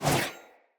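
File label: Sword Whoosh left to right; Weapons; Player; Swords; Fey Sword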